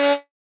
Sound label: Grass Strimmer, household rhythm samples Hits